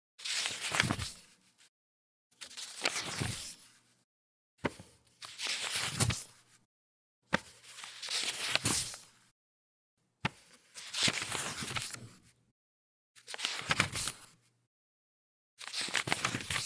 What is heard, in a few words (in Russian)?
zvuk bumagnyh stranic